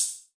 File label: classic hip hop; STYLE KITS (Hip Hop, Etc); Classic Hip Hop Mega Kit